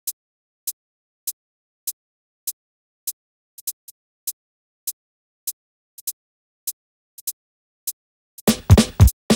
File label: Drums